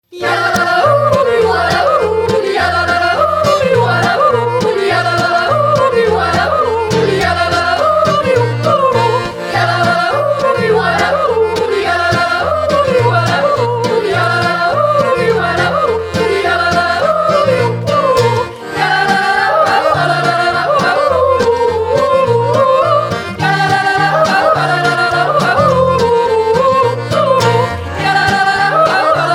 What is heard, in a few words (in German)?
jodel